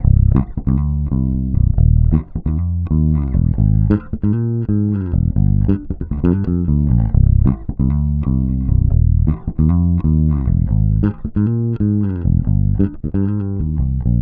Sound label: Bass loop 135 Cm, Electric Bass; Loops; Vermillion; Slate Digital BASSHOLE Sample Pack